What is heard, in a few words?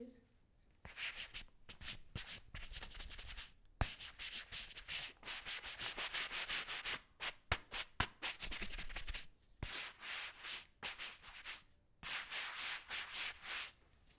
Rubbing The Polish Off Of Shoes